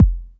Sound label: Kicks, Elektron MachineDrum SPS1 MKII